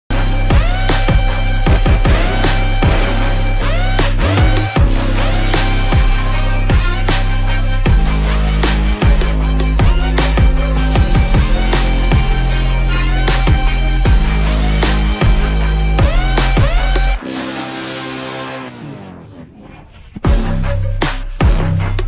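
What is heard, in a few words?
background music ringtone; kalki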